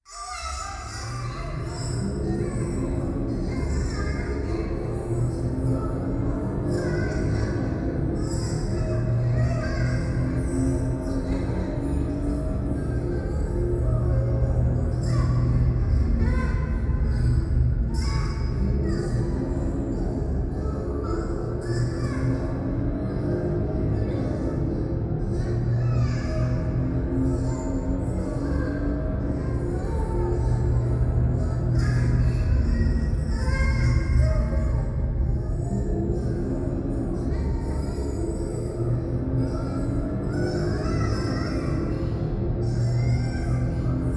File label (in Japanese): 風呂 シャブ喘ぎ 車の中, ASMR